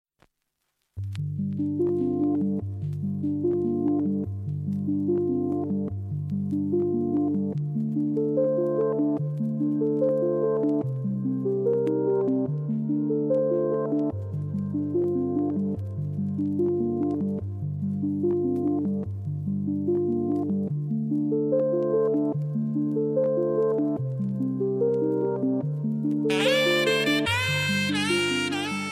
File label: nice alarm ringtone